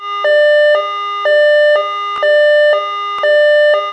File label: two tone; Tomar Electronics